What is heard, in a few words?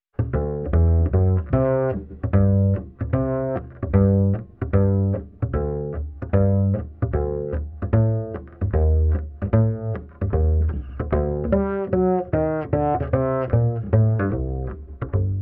Ragtime Bass